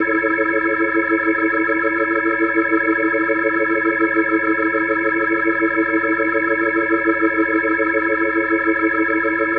Organ A Maj+8va, Organ Licks; Ambient Cinematic

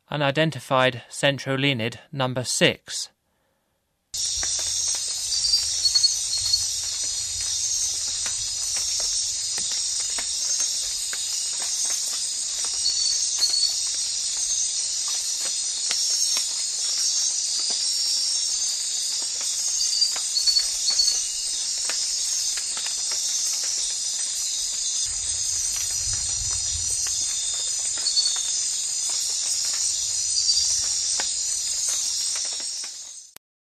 centrolenid, Frogs of Ecuadorian Amazon

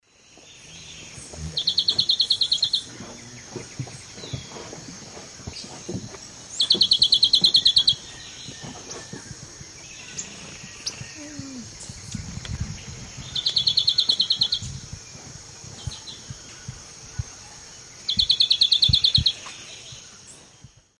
Polioptila plumbea, song; S. America; Eastern Lowlands

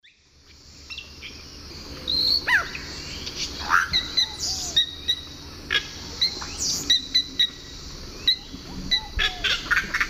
Cacicus cela, calls; S. America; Eastern Lowlands

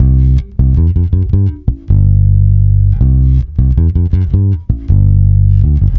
Bass C Funk

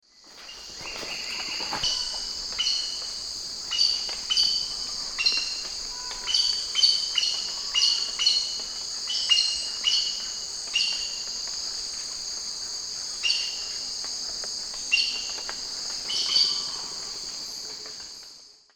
Pteroglossus pluricinctus, calls; S. America; Eastern Lowlands

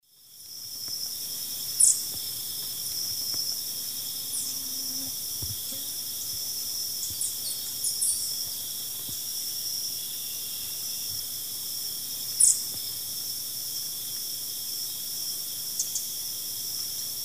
Dacnis lineata, calls; S. America; Eastern Lowlands

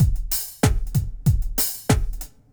bumping 95

